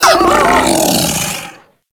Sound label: Kubrow Feral Vocal Death; Enemies; Warframe